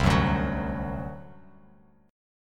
C Suspended 2nd Flat 5th C down Bass Standard; Csus2b5 strummed

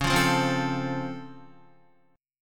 Db Augmented Major 7th Db down Guitar; strummed; 4F,4A,5C,5G,5C,6E

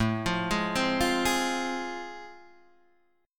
A Minor Major 7th Sharp 5th, Guitar Open D

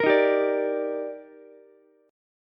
D sharp Major 7th, Ukulele; strummed; JGuitar

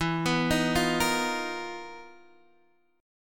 B Major 7th Flat 5th E, chord shape; BM7b5/E; arpeggiated; JGuitar

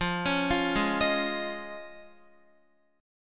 F Minor 7th Sharp 5th, Guitar; Fm7#5; arpeggiated; x,8,11,10,8,10; 4E,4A,5D,5G,5C,6F

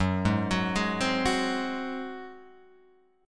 Gb Suspended 2nd Flat 5th Guitar Open G

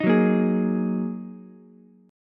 Gb 6th Add 9th Gb down Ukulele C6; strummed; Gb6add9; JGuitar

